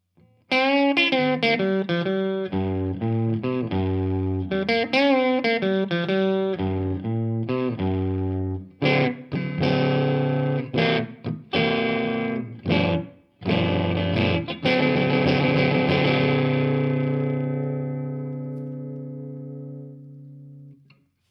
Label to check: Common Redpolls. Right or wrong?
Wrong